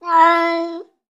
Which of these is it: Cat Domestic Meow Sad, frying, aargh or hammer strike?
Cat Domestic Meow Sad